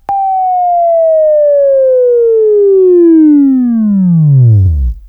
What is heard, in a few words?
Buzz